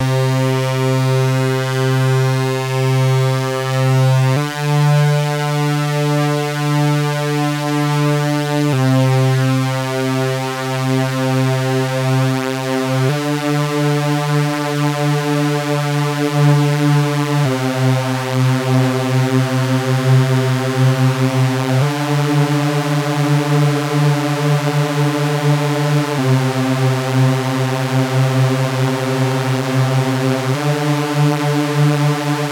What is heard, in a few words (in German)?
OB6 P6 Vergleich Detune Unison4